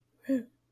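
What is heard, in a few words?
gentle roar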